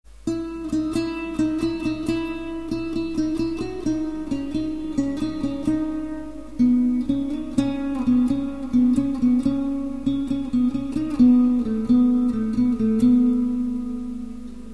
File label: lovely guitar tone; Love Tones